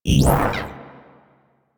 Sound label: Battery Deactivate; Warframe; Cowgirl